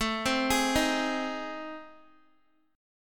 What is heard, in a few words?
A Minor Major 7th Flat 5th, Guitar; arpeggiated; AmM7b5; x,12,12,13,15,x; JGuitar